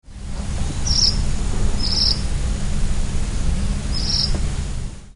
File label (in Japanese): ryukyusansyoukui call; yanbaru